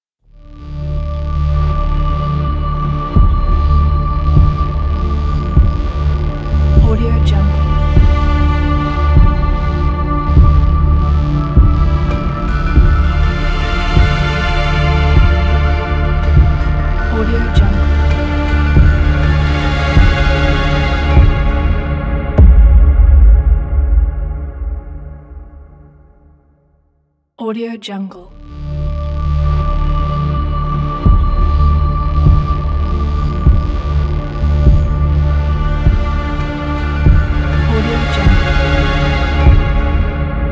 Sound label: serious science logo